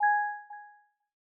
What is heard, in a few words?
Tracker Start; Peloton